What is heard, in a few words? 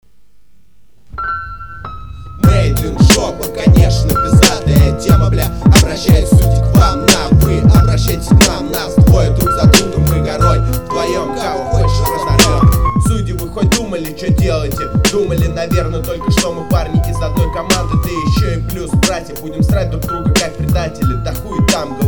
rap is life